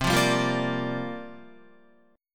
C 7th Suspended 2nd Suspended 4th, C, down, Guitar; strummed; C7sus2sus4